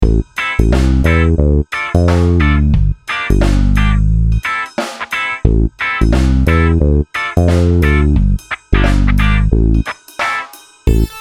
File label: Slow Ska Game Loop